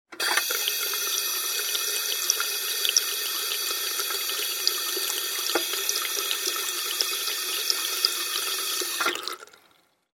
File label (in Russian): fontachik v vannoj komnate